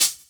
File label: Hhat, Hihat; Equipped Music; Loungin House